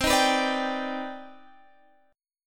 C Minor Major 9th C

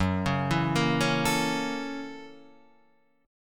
Gb 7th Sharp 9th Gb, guitar

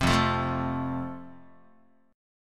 F Major, Guitar; strummed

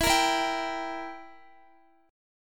E Diminished down Guitar; strummed

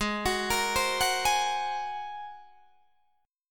G sharp 9th, Guitar, Open D

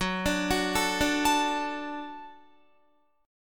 F sharp Minor Sharp 5th F sharp Guitar; F#m#5 arpeggiated; 14,17,16,14,14,16

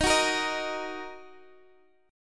D sharp Minor D sharp, Guitar Drop D; x,18,16,15,16,x; chordshape

